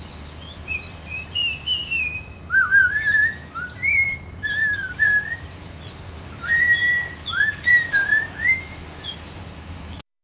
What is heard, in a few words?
whistling and chirping